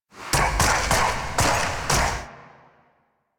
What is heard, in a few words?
Zeal Pre Teleport Cin Steps; Warframe; Emissary Season Two